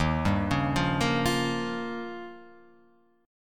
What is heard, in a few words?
Eb 9th, Guitar, Open F; arpeggiated; 3,2,1,0,1,0; JGuitar